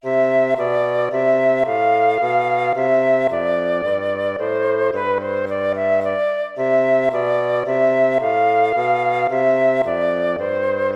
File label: tempo minuetto; hook james